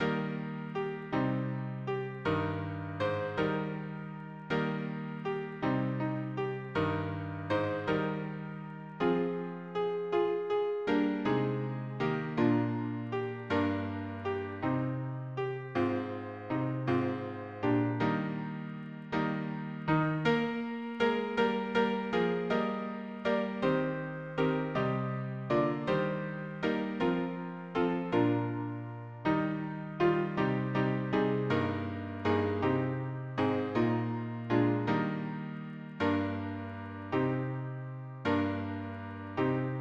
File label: harmony piano